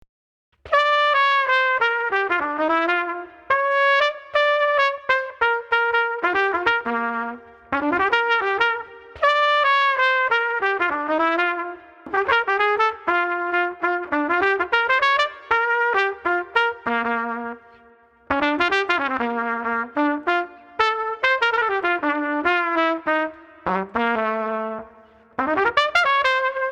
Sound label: Street Trumpet Dixie Melody 120